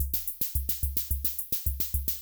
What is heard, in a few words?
Kay R-12 Rhythmer Loop, Drum Machines; Kay R-12 Rhythmer Sample Pack; 300 Drum Machines